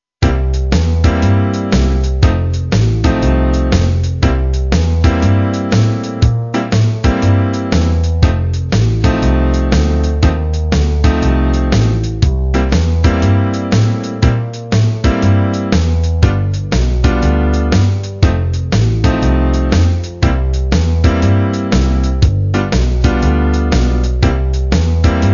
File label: blues